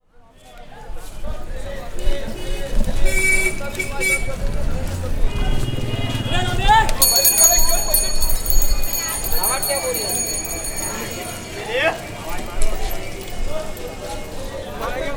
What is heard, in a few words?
BOMBAY, AMBIENCE, Indian Traditions; USB Soundscan vol.45; AKAI